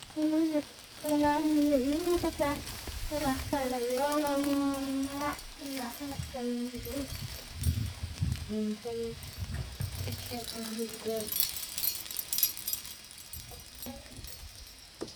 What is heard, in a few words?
laughter and chatter, cloth rustling